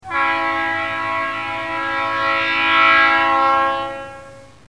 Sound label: Train